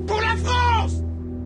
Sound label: charge, Voices Emotes, fr